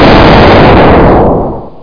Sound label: shoot; venture